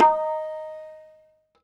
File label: hi hit, tabla; Dirt-Samples